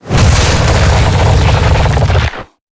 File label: creature chelicerate roar